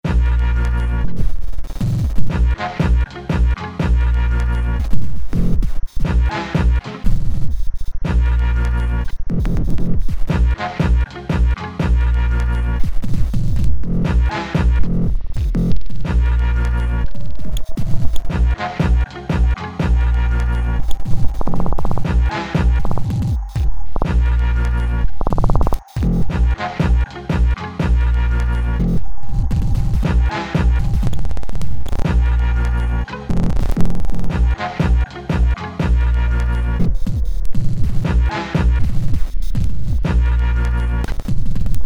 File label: karaoke